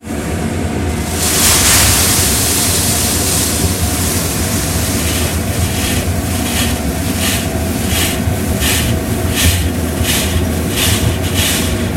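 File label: coffee bean sounds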